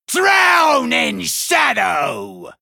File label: Male Human Attack